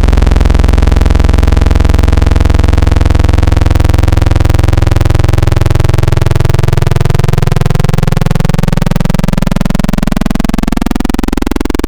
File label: Digital; Pyramid Scheme; Synthetic's Serum Collection; Echo Sound Works Core Tables